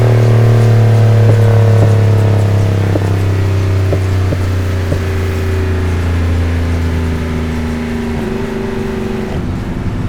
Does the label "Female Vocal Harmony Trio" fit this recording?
No